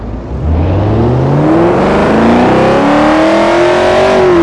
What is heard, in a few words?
first, vehicles cars camaro; camarozl1; tdmcars